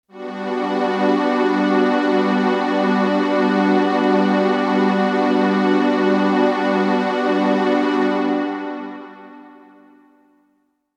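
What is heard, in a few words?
Synth Pad G minor 7; Sonic Foundry (Sony Creative Software); Techno Synth Loops 1 Signals I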